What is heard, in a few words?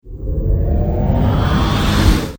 Warp Start; Star Trek